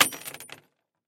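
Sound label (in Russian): zvuki tsepei, chain sounds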